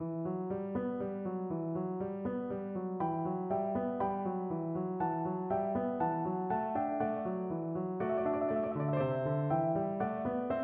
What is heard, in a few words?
Spanish Dances, piano; Grandos